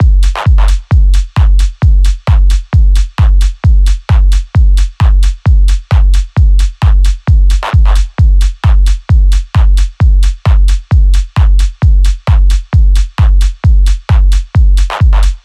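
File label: Drums 132bpm F minor Analog Layered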